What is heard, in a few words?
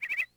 shops bird; Tony Hawk's Project 8; XBOX